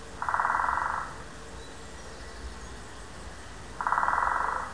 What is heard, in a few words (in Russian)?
bolshoj pestrij dyatel dendrocopos major